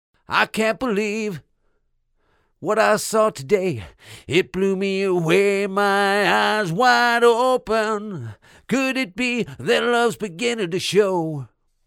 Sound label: Vocalist Treated Room